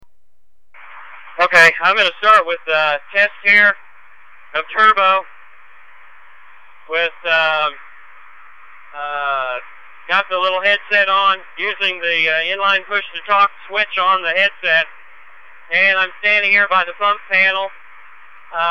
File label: Fire Engine Audio Test